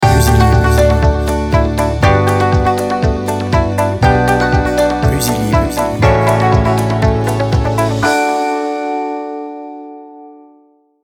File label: positive, jingles